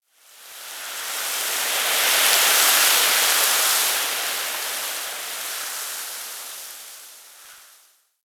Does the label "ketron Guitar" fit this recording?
No